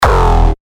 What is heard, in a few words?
Kick E Plasma; revealed magma kicks